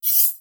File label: sword drawn